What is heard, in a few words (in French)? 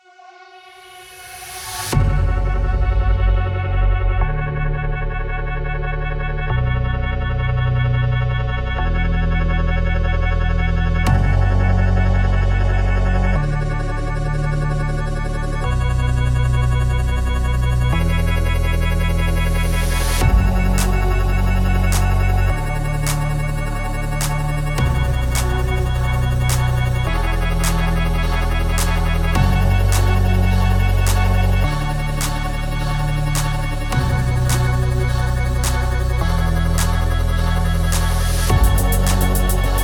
musique fond court